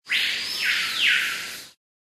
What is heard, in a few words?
animal call bird; Source Engine; tf; lair